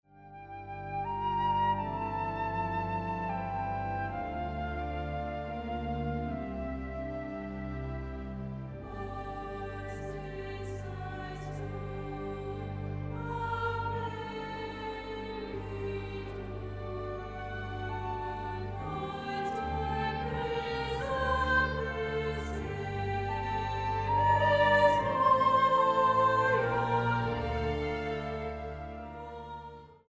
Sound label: coventry carol